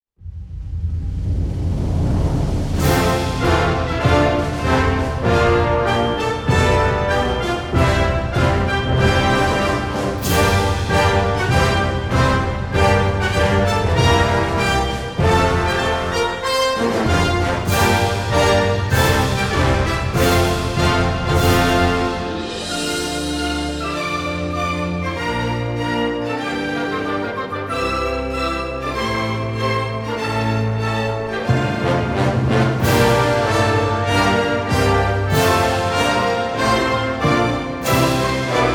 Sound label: National Anthem